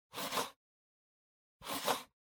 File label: PC Mouse Move Wood Short